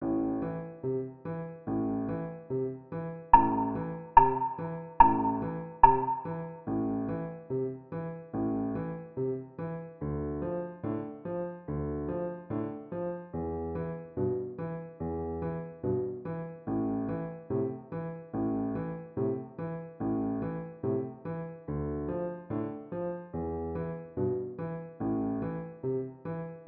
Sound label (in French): violon